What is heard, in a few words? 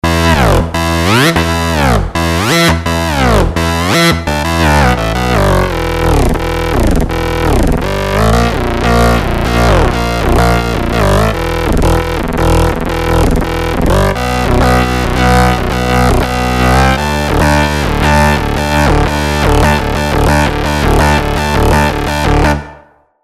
Plan-B Model 25